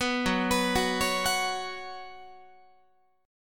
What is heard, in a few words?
Gb Suspended 4th Gb, down, Guitar; arpeggiated